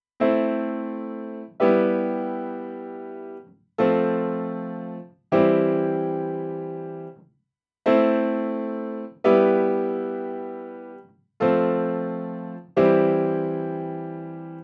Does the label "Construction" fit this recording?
No